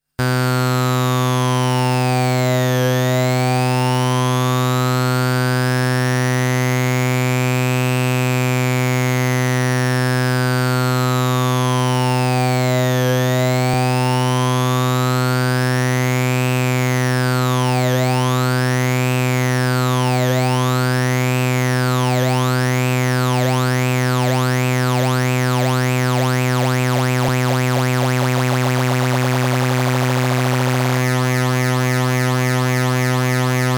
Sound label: Phaser